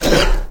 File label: wolf noise